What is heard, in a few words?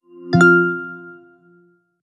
iPhone 17 Pro, iPhone Boot Chimes, Chime